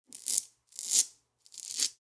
Vegetable peeling with peeler; cooking

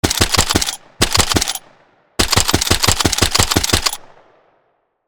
Heavy machine gun automatic fire bursts; guns

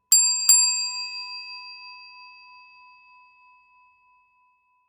Reception desk bell ring x2

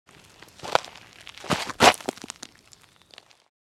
Human falling on sand slide close perspective

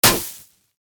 Party popper confetti celebration

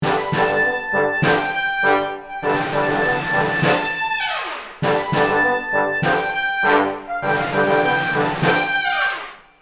Orchestral march jingle brass and percussions

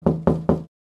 Knocking hard on glass window pane

